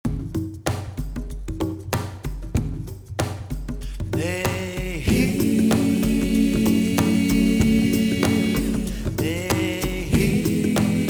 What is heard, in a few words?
Ethnic Chant